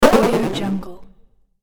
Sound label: platform game fall